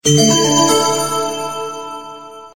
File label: Cool Short SMS